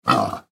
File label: Pig Grunt; Animal; Pigs; Blastwave